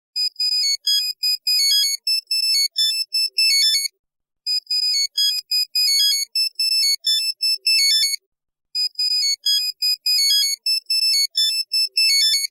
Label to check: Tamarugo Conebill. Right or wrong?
Wrong